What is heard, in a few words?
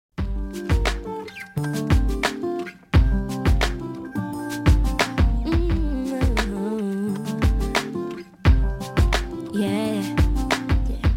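guitar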